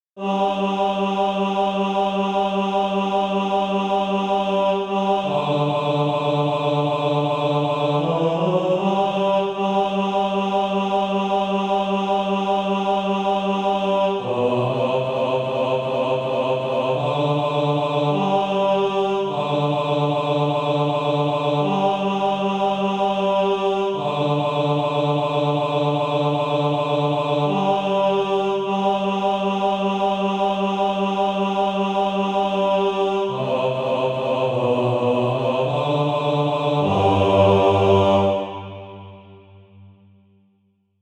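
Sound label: bass, Practise Music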